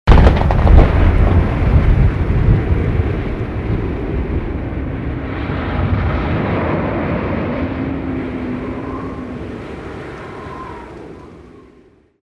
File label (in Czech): hrom